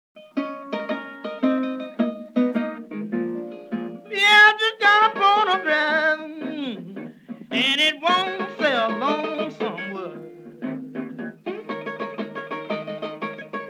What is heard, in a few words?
Blues